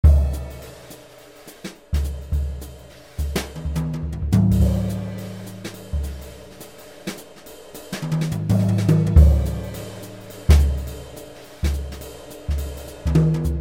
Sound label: Dyn Brush KIT, xv-5080; SRX